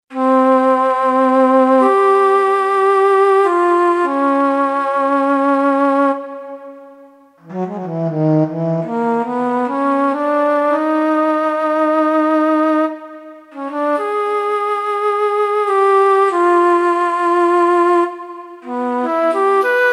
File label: Orchestral Flute, Kurzweil K2000; Kurzweil K2000 Value Pack; Extra Programs; ORCH1X